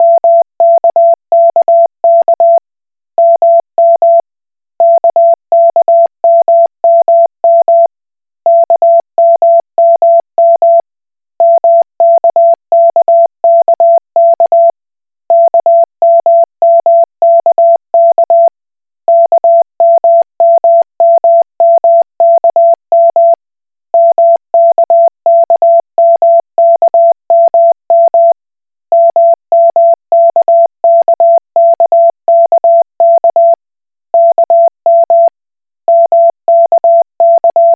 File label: Koch Morse